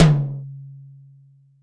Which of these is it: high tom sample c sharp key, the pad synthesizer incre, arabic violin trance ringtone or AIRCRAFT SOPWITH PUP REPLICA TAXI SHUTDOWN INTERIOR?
high tom sample c sharp key